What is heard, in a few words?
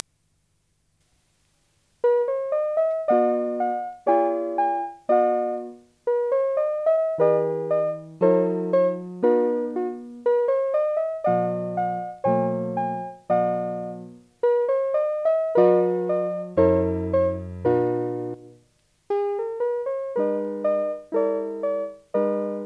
piano; Piezas para piano; Divertimento 2